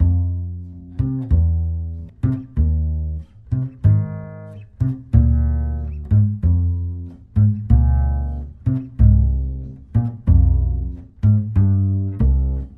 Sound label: bass, Loops